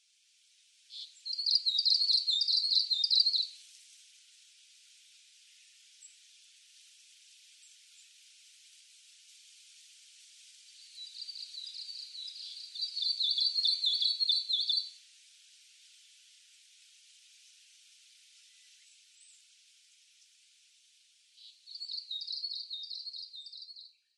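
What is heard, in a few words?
Japanese leaf warbler, hayachine; meboso; koe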